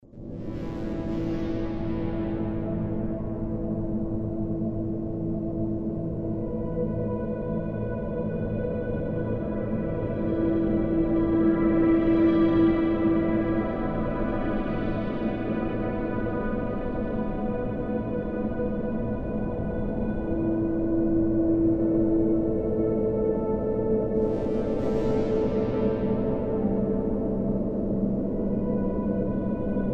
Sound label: Amb Sound Scape Ethnic Mandolins, Light Wind; Ambient SoundScapes